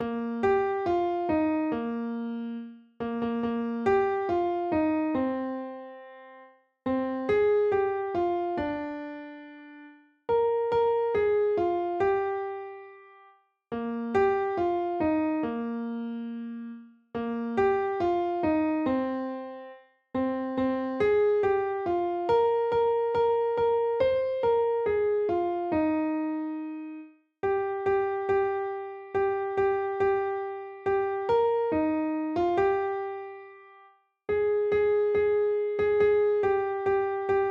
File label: Jingle Bells (Eb Major)